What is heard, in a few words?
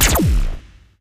capper shoot, weapons; tf